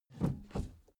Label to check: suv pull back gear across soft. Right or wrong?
Right